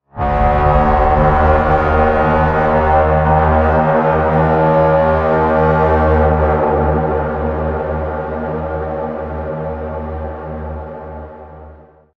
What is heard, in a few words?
raidhorn; event; Minecraft; Votive World